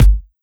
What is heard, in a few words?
Techhouse Bassdrum; Mutekki Media; Vengeance Electroshock Vol.2